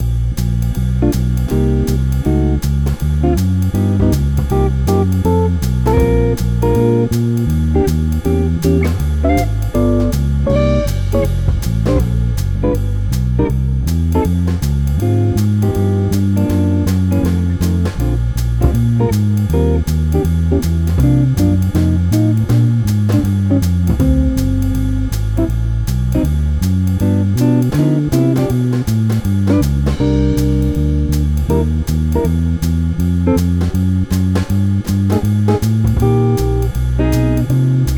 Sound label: Rhythm Changes Backing